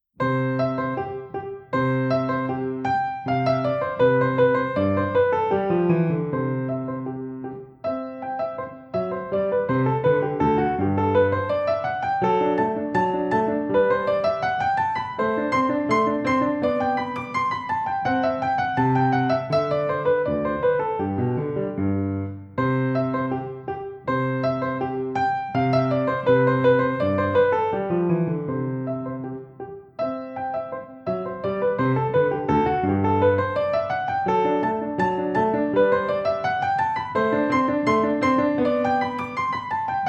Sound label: Sonatine in C majeur